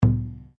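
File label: Drum Taiko piano, Japanese Taiko Drum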